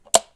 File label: switch; kenney; uiaudio